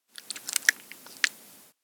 dog lick face weak; jet; anemoi

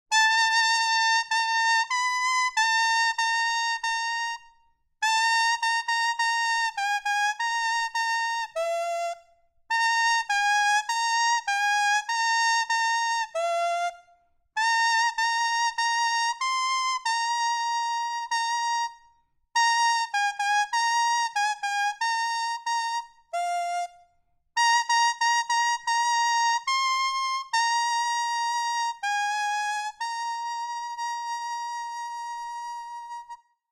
kusa Kagomekagome; anemoi

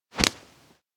menko card throw; anemoi

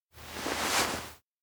peek face; aino

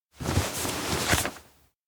bag rummage letter; anemoi

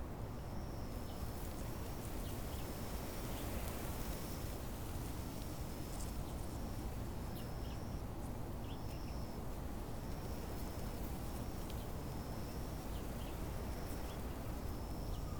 outdoor abandoned station front night; anemoi

